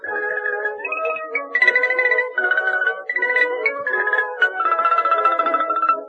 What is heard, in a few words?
xylophone; VOREStation